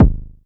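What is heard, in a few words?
Club bass drum, Jomox Airbase99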